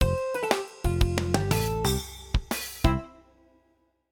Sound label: Jingle Lose; Dobutsu Shogi World; PS4